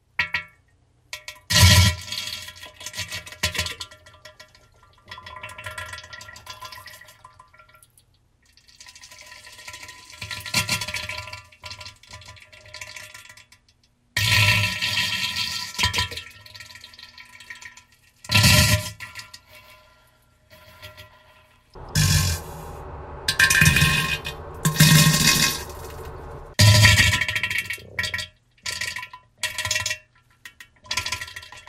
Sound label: diarrhea; cave